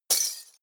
glass; comedy cartoon

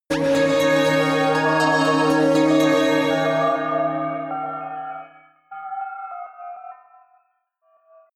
synth bells roll high, drones

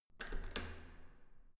entrance door handle use hard

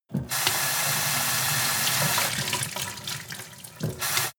sink water pot hard; industrie-wirtschaft; gastronomie

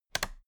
toolbox latches close regular double; industrie wirtschaft; handwerk

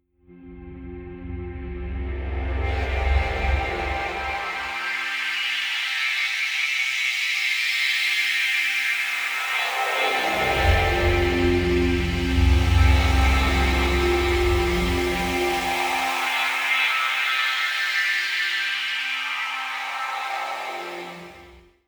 pad sweep hard rise; flaechen

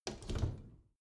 bathroom cabinet hinge scratch

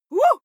female shout surprised

